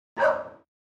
Hund

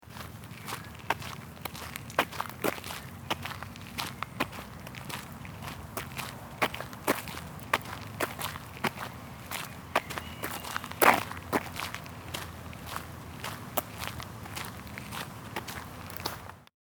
Schritte Kies

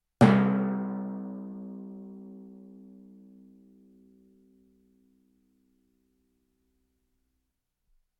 Konzertstimmungen Paukenschlag Auf C

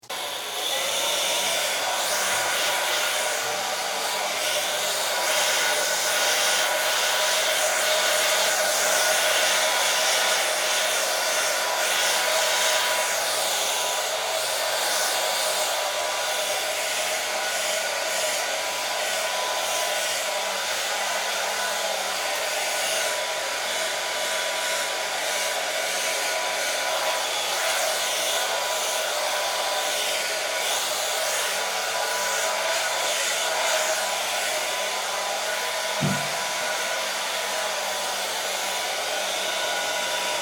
bad foen

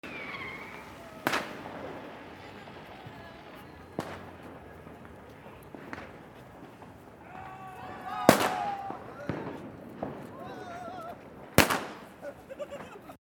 Silvester Menschen

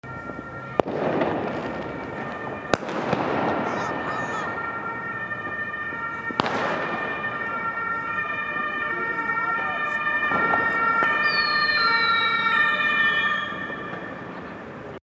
Silvester Martinshorn

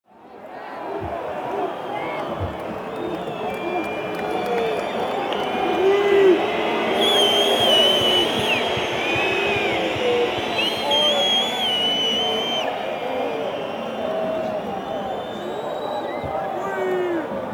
Stadion Buhh und Pfiffe

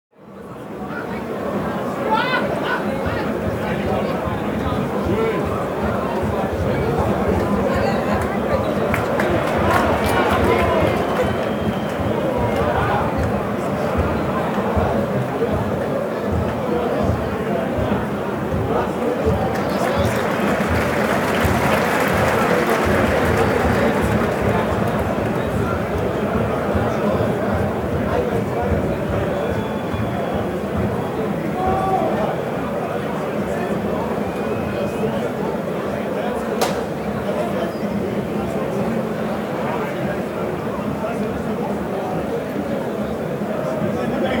Stadion Atmosphaere

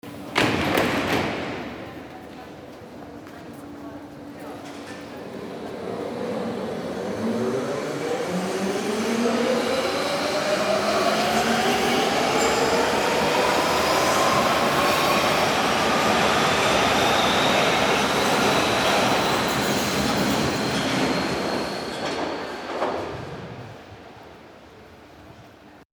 u bahn tueren zu abfahrt

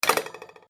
Metallttuer schliessen

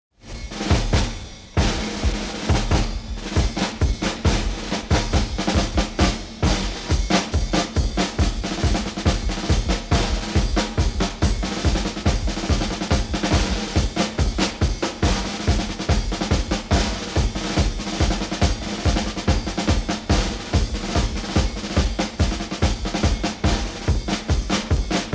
Hornsignale Parademarsch

